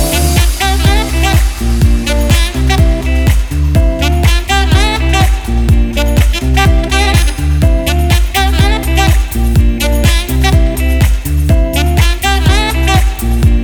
sax

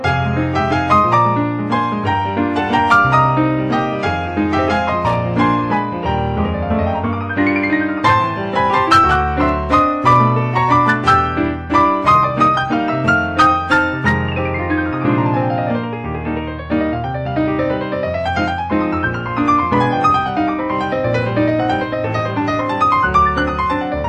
waltz; the legend of 1900

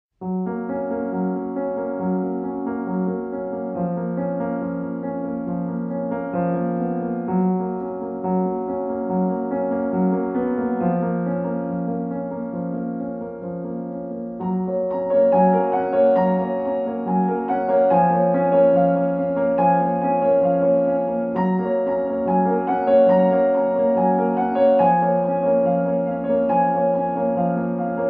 fortepiano

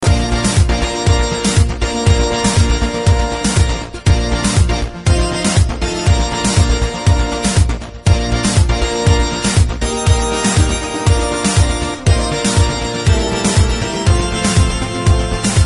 here come the bride techno remix

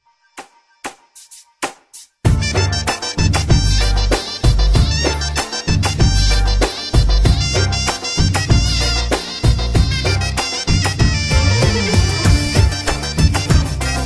arabian instrumental

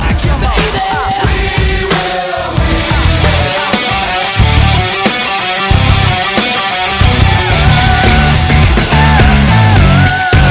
metal mix

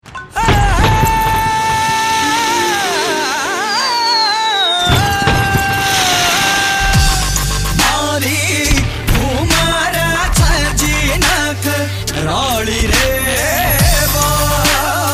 nokia lumia dubstep; ringtone

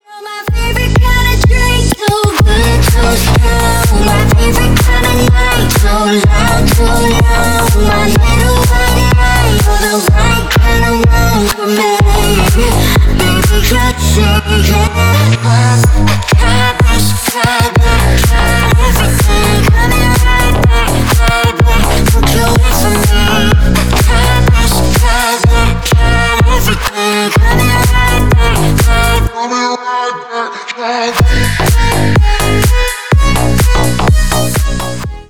rock n roll, ringtone

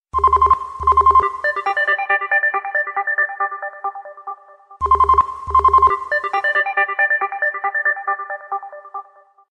new ring bell

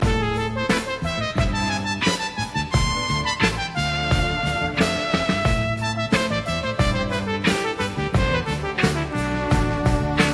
sax solo; ringtone

